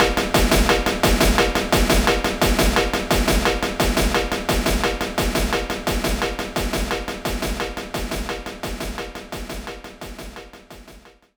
Fill; Sly Cooper and the Thievius Raccoonus 2010 PS3

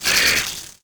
Monster Bee type Attack

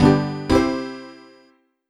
Jingle Comic End; WarioWare D.I.Y. Showcase

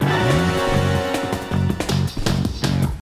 Action START

